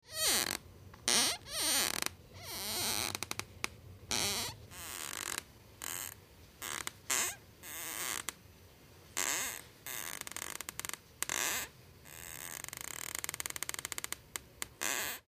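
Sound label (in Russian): скрип деревянного пола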